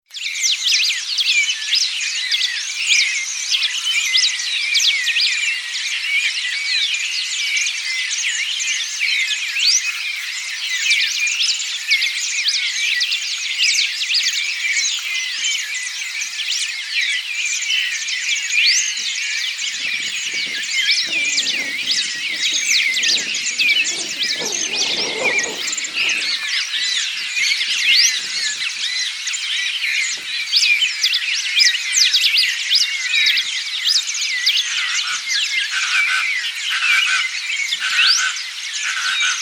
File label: Birds at Dawn